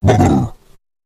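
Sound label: free spins grunt shake head; wild worlds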